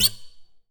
Classical Guitar Scrape; Classical Guitar Extended Technique Stereo

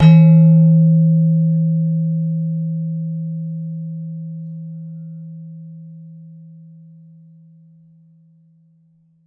Gender, Gamelan

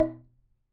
Wooden Bar Hard Strike

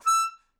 Melodica Short; Short Notes Chromatic

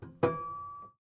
Prepared Piano

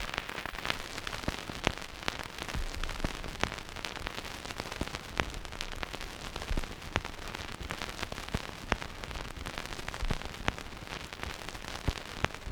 Vinyl Loop

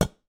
Short, vase

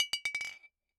Bottle Struck

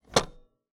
Typewriter

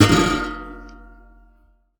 Classical Guitar Extended Technique

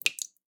Drips

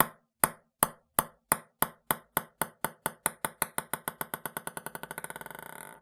Ping Pong Ball Bounce; Balls

